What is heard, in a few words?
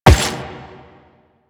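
run on rock, footsteps; Warframe; The New War; Kahl; Quests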